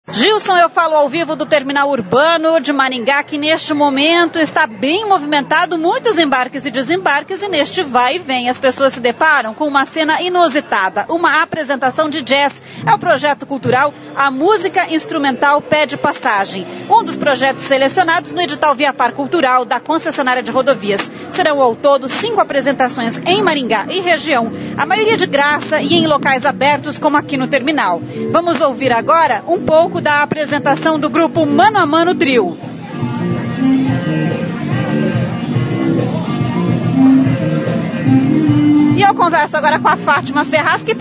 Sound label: Jazz